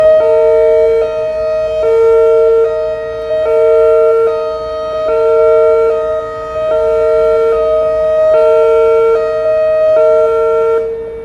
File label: siren